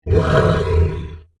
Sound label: Monster Growl, Horror, Monster, Growl, Creature; Monster Sound Effects; BlastWave